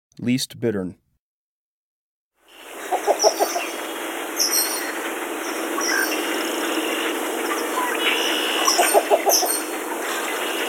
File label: Least Bittern; Breeding Birds of the Chicago Region